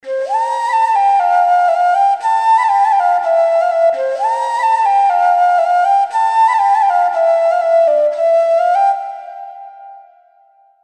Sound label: spanish flute; Electronica